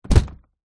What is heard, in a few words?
flight case place down on carpet floor; foley